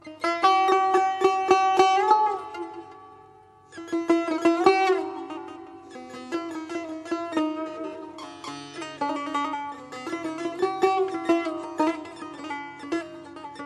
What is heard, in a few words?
Tar Tonbak